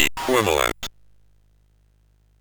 sid MOS6581R2; Cave of the Word Wizard Set 3

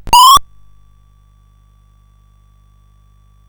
sid CSG8580R5; Frogger II-Three Deep

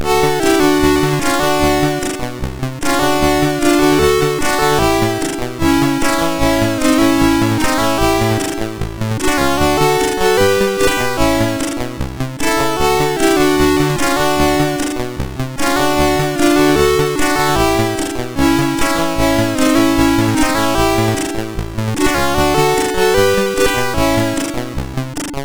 sid MOS6581R4; Peter Beardsleys International Football

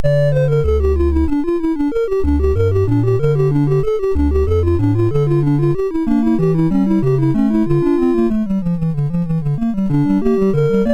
Rocket Ball, MOS6581R4

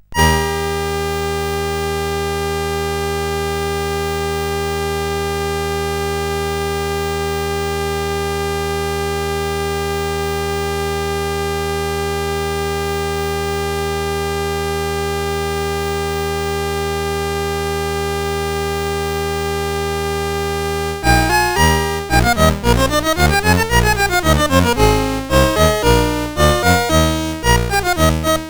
sid CSG8580R5; Bird Mother

